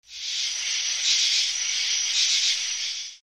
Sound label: Crickets